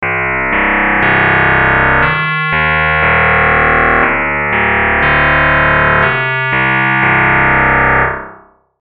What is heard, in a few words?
lin sine